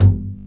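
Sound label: String, contrabass pizzicato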